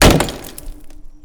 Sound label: pot breaking sound